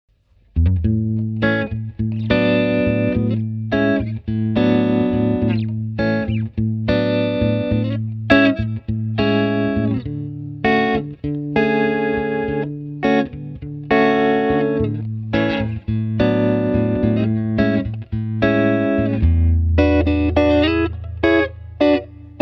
jet b, ampeg jet 12 gitarrenverstaerker; Mikrofontest; A/B Vergleich

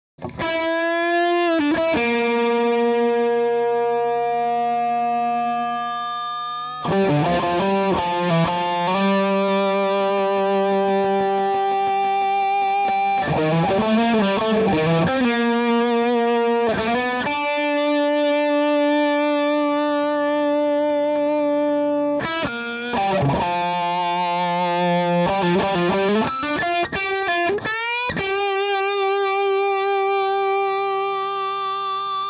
crunch 414 strat; Amp

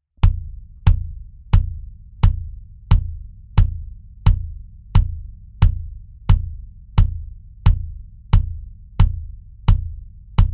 bd eq mbcomp limit

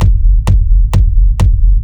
kicktest linphase medium; Kick-Sound design

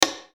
click

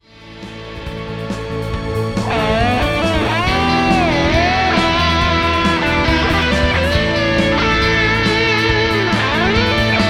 solo, prog metal melodic

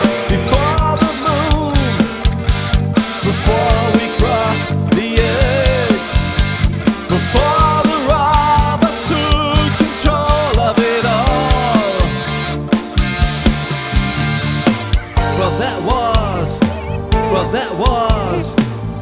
remix

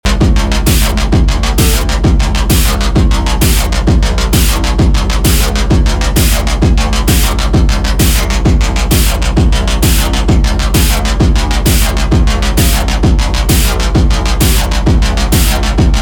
pendulum snippet mit kick, industrial techno

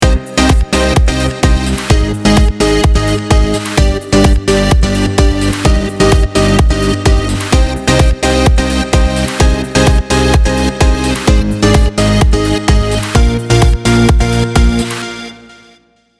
lead, Lead Synth

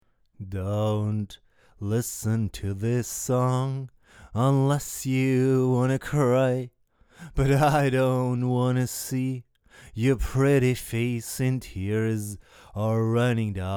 vox raw

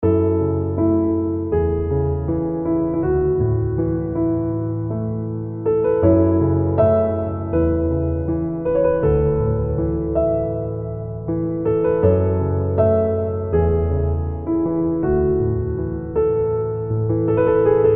vintage d, Klaviermusik; Best Service Galaxy

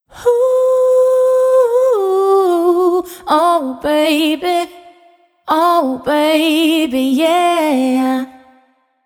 UAD Dreamverb vocal

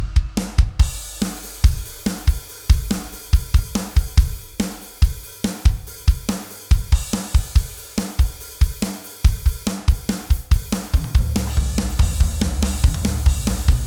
drum buss; OwnTHD; analoge Sättigung; Three-Body Tech; Das Audio Effekt-PlugIn OwnTHD

